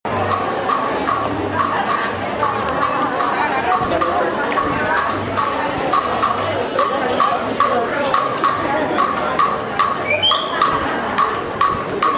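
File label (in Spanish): Sonidos Calle Obispo, La Habana Vieja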